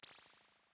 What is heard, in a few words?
impulse spring